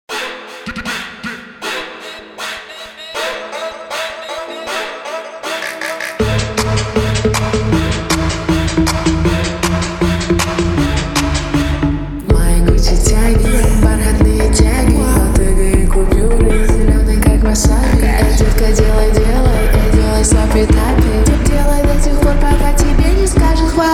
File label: slowed reverb